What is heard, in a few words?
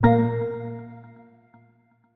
Jingles PING; Semblance